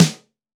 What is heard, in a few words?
LD Snare; Snares